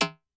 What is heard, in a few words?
Perc Reso, Vermona Mono15 Drums